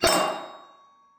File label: shield; Dungeons Of Kharadum